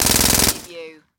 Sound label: Submachine Gun Uzi